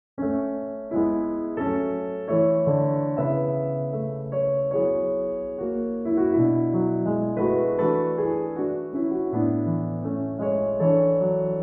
Solo Piano

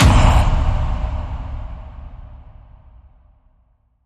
Predator Gothic Hit

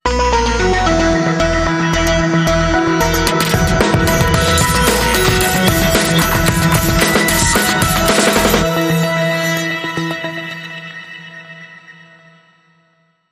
Glitch Sting

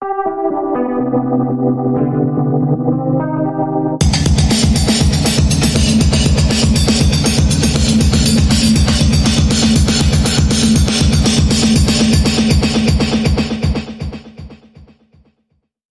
Grounded Dance Bumper

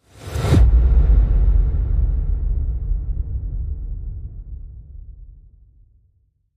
Short Whoosh Boom

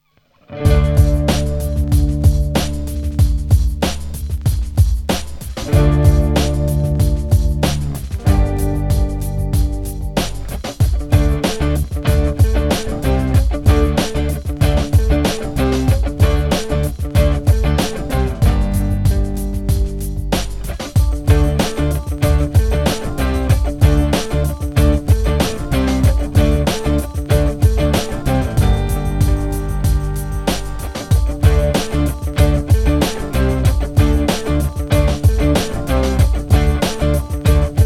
Guitars And Beats

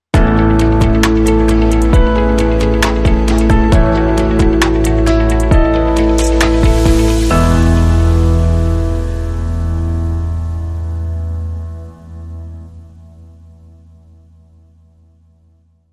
Sting No Lead

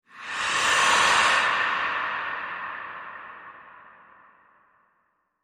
Transition Scary Inhale

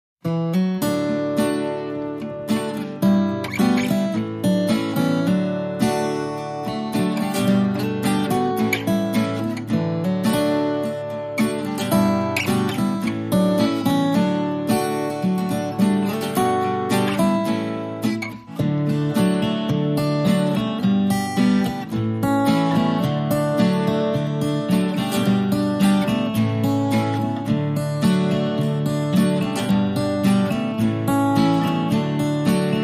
Acoustic Guitars

